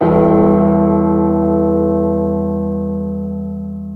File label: Bell; Sins Mod